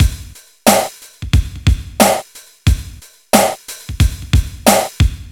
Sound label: BPM 90; AKAI S-Series CD-ROM Sound Library VOL-4